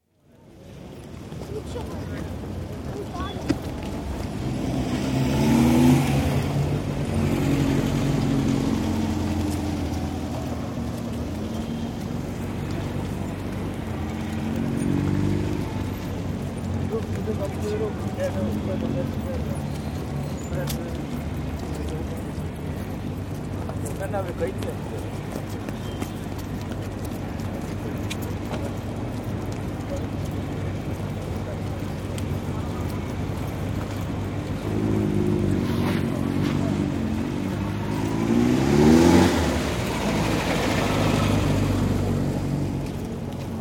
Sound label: Istanbul, street atmosphere, with voices, footsteps, and some traffic; City; BBC